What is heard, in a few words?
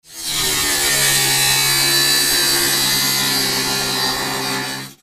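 sounds of angle grinder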